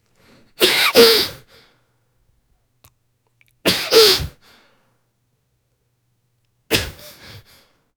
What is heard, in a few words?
a man sneezing, allergy